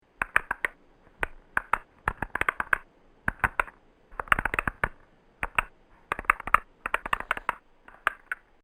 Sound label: Pc Keyboard Random Key Presses; Computer Sound Effects